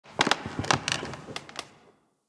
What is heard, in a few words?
Station Misc, Ambience; Soul Plane; Orlick